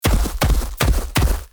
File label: Velociraptor dinosaur footsteps fast